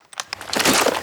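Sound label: weapon foley fal reload lift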